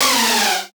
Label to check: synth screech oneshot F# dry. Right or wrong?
Right